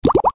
Water Drop Notification

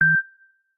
Beep Once

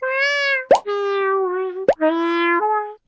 Yawning Cat; Xiaomi Mi3; ringtones

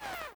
Lock; OnePlus; 8T Cyberpunk 2077 Edition

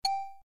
Video Record, ui

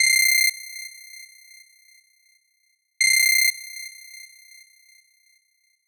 Ticktock, alarms; Gionee ELife-E7

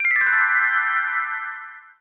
Missed call; HP iPAQ Glisten

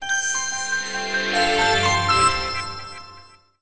Mystic power on; Power Sounds

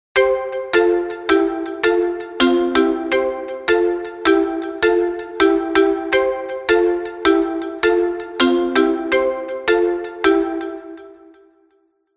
Smooth Buoy; Alcatel OneTouch V985N

